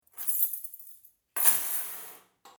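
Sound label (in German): Schluessel nehmen und werfen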